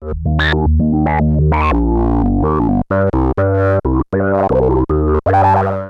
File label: VOCODIZER; Syntec; Wall of Sounds; TECHNO-BOX